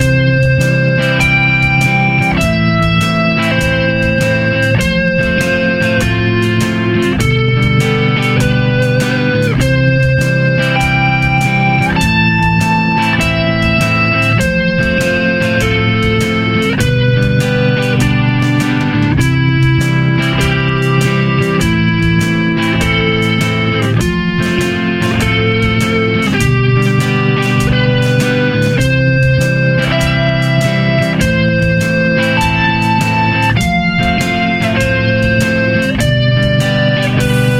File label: roots 3rds 5ths